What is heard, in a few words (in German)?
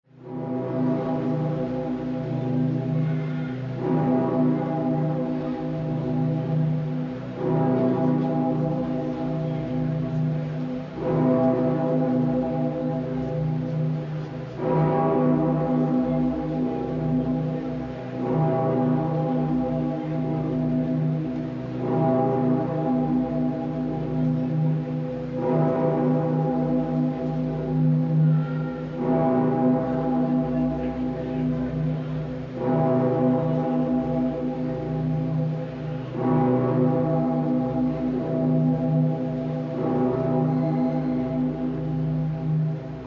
glocke; Kölner Dom; Turm